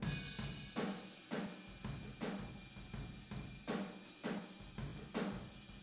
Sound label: Boogaloo Chorus Room ST, 82 BPM; Drums from the Big Room The Mixes